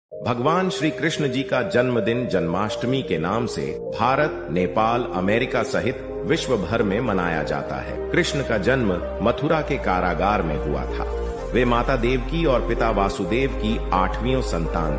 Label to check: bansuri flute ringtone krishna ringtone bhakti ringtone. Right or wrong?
Right